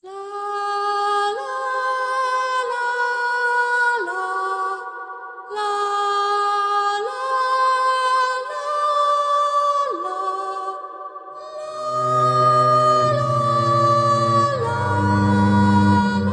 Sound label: Celtic Requiem